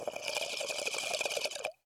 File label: tone; seasonal